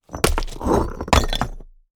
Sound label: Various bricks dropped on a pile of Stones with debris